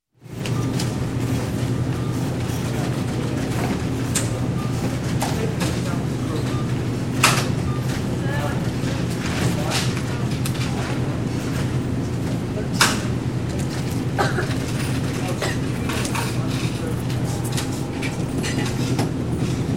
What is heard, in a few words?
Store, Grocery Checkout Counter Voices, Registe; Crowd; Sound Ideas 2000 Series